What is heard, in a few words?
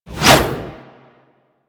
Reaper Scythe Whoosh